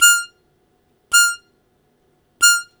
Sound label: Short Attack F3, Harmonica; Zero G - Harmonica